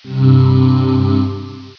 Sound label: move red; Game Zone; ABALONE